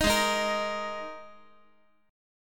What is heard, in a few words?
Gsharp Suspended 2nd Gsharp Guitar; strummed; 4A,4E,5A,5D,5G#,6C#; x,x,6,6,7,9